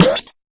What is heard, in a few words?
fx drum